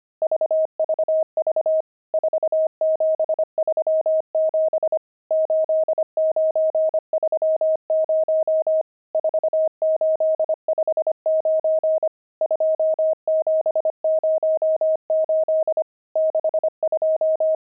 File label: pangram, intermediate pangrams files